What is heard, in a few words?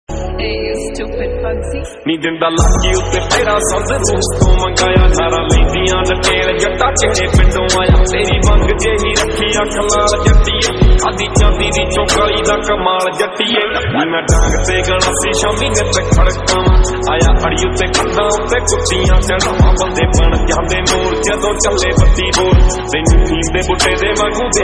lofi ringtone song ringtone lofi ringtone song